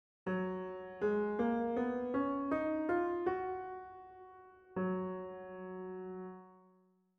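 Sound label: Gb major scale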